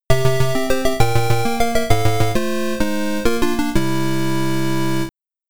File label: Game Over (PSG); Knightmare; MSX